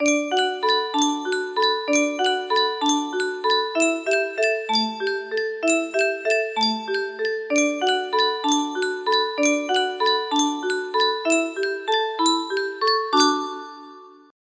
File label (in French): Yunsheng boite a musique